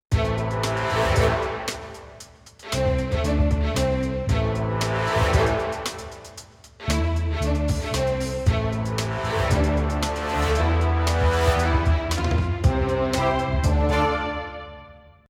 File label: Spy Bounce